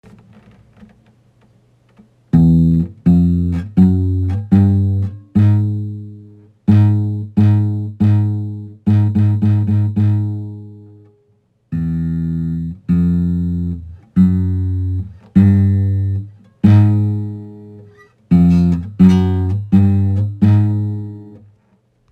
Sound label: vibration